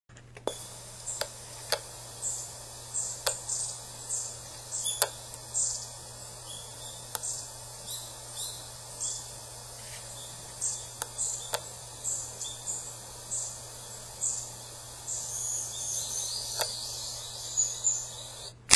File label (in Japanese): エナガの群